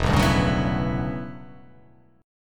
E Minor 13th chord

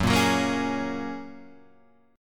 F sharp Major Add 9th, guitar; F#add9 Chord {2 1 4 3 2 4} strummed

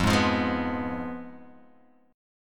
F Diminished, Guitar, Open G; 3,1,3,1,0,x; down; chord shape

